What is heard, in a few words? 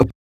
Beatbox; neuro; Sinistarr